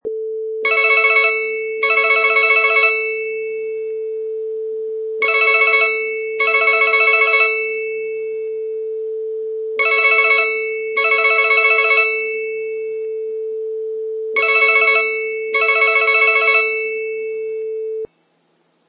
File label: Cell Phone Ring